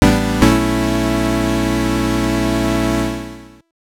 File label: Jingle; turbografx 16; Urusei Yatsura Stay With You